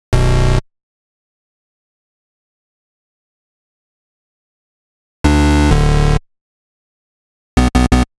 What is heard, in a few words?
129 Bm Bass, Complex Electro Synths; Prime Loops; TranceOut